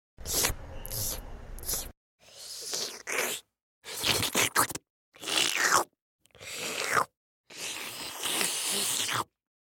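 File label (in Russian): еда лапши порошкообразный суп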